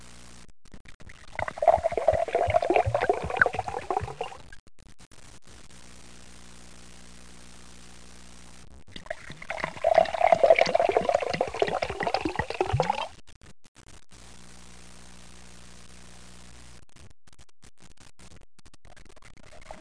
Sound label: liquid pouring